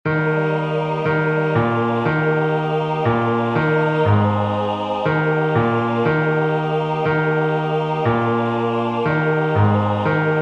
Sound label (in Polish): bas; Alta Trinita Beata